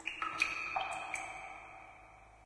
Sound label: ambient water drips; source engine; portal2